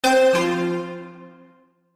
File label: Chime Musical, Chime Musical Multimedia